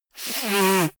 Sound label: nose blow; comedy cartoon; koerpergeraeusche